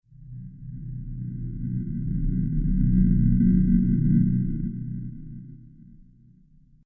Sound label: Deep Space Motion, Science Fiction Sound Effects